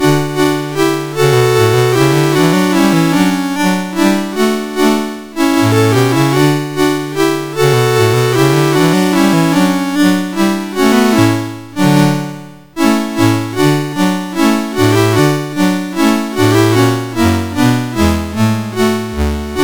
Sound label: Chorale, MOS6581R4